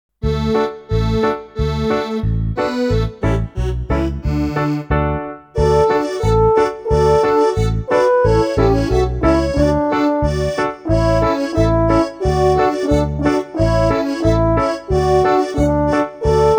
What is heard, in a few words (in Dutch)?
zonder zang